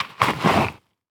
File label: Foley Footstep High Heels Dirt Debris Skid; Foley Footsteps